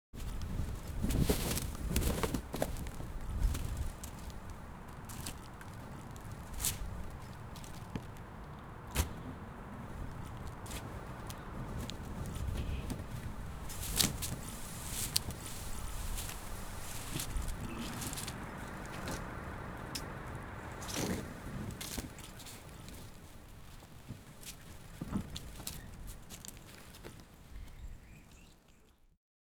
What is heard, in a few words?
walking footsteps in sile, indoor